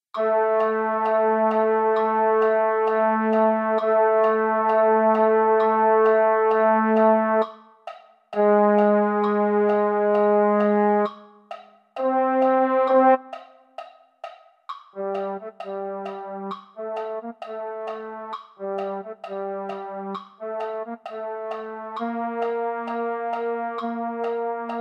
baritone; concert corps

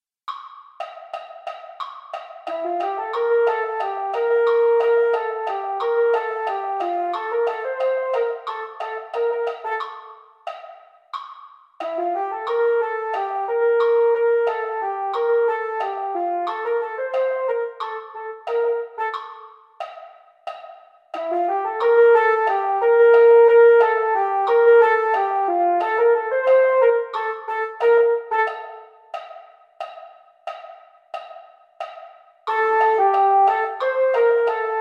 mellophone slow practice exercises; mini corps

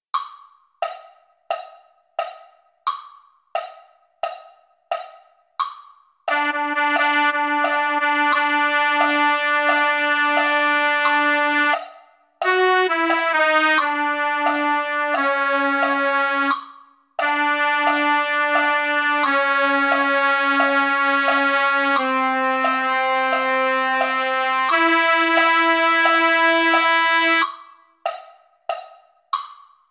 trumpet; holiday brass

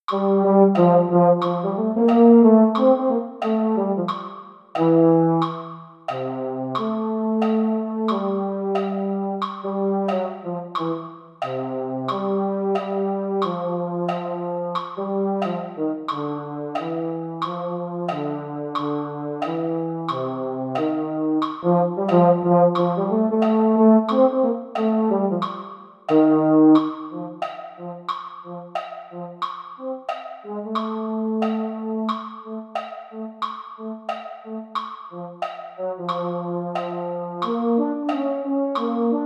baritone; holiday brass

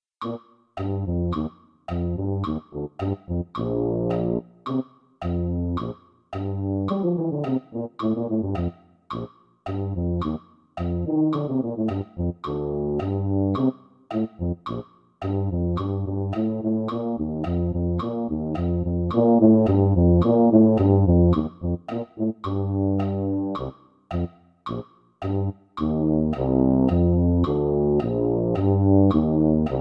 jingle bells tuba

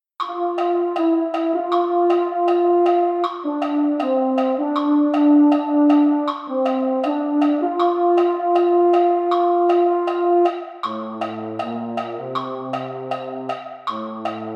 Baritone; concert corps

